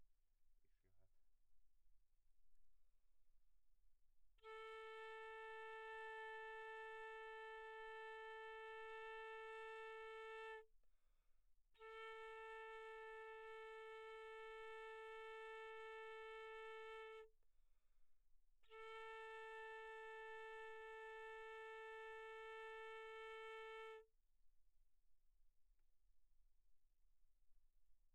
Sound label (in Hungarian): fuvola A1